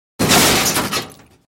Car Crash Small Short Metal Impact With Small Glass; Crashes Impacts